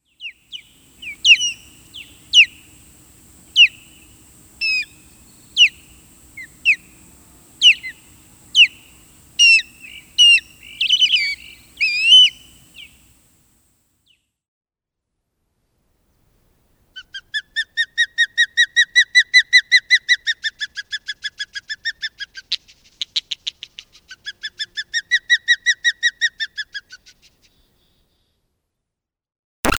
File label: Osprey